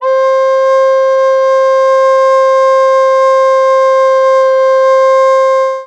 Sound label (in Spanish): flauta